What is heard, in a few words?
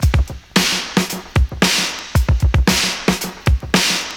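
HIP-HOP; AMG - Now CD-ROM (Roland)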